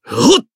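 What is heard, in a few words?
Voice attack, Japanese; zeviro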